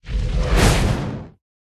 Pet Elemental Fire

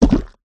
jelly fish block

